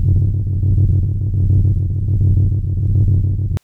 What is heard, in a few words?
StarShip Sounds